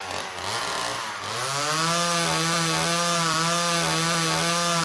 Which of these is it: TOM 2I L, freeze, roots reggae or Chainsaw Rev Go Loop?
Chainsaw Rev Go Loop